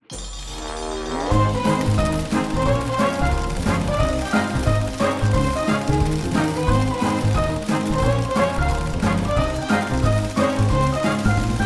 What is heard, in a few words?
super mega win; netent